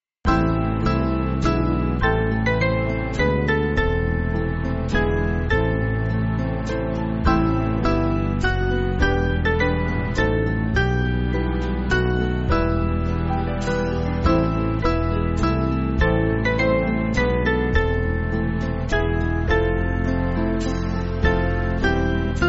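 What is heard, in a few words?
Band, Backing Files for Churches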